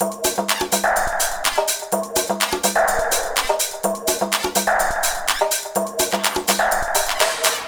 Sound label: DRM, LOOPS 125, House